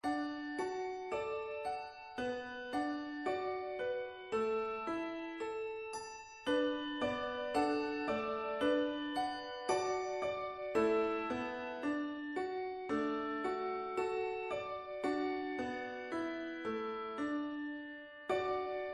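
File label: playback handbell piano; I Know That My Redeemer Lives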